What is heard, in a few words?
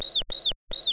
mouse